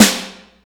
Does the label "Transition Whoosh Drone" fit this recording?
No